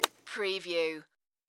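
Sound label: Golf Drive Wood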